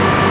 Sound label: ford V8; Mafie 1